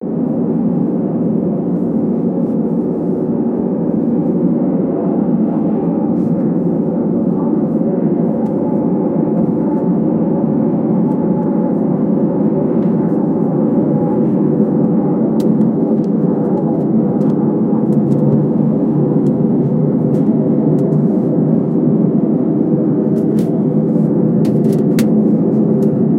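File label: sound of crashing airplane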